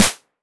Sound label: Clap